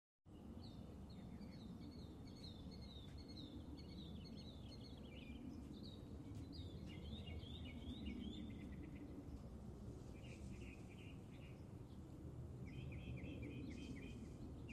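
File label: in a quiet city courtyard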